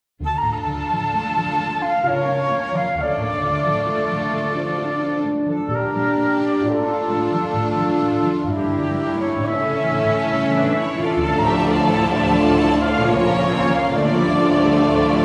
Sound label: orc theme; svencoop; gruntsparty; colorplates